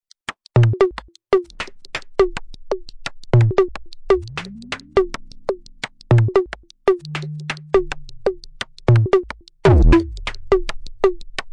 retro bleepy DnB

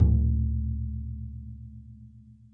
hirado, Japanese Drums; ETHNIC and WORLD PERCUSSION

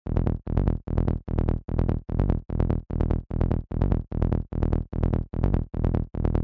148 speedy trance bassline

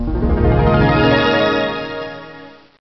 Huge Arpeggio

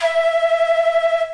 fluter flutter, Flutes

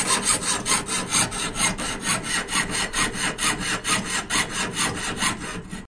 sawing metal; Sound FX Real Life

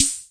sdhh, Hammond Drum Machine; DRUM MACHINES

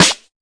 fx drum

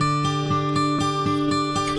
acoustic guitar picking; GUITAR LOOPS

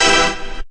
supercheez, Organs

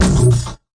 fx drum

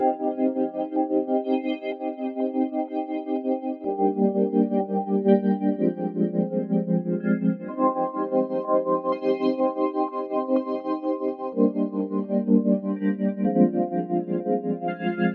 125 oscillating vibes chords; KEYS ORGAN PIANO LOOPS

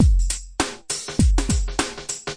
gated rap, 102 bpm, drum loop; 101 to 110 bpm

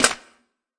trigger bap; Hip Hop Specialty Kit; STYLE KITS (Hip Hop, Etc)

